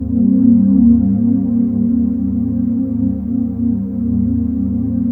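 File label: trance pad; Best Service; XX Large - Pads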